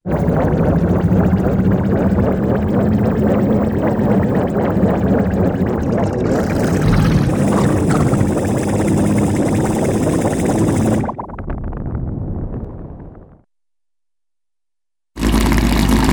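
Machine Steady with wet Spinning Sound That Becomes Faster, cartoon; Hollywood Edge; Cartoon Trax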